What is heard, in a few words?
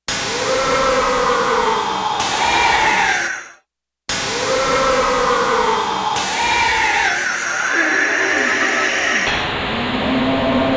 Gated FX